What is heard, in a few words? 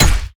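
worker hammer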